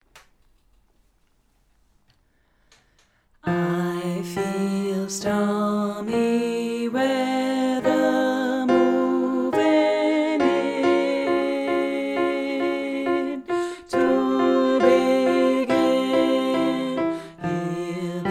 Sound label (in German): Alt 2, Singalongs